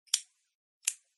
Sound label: Notifications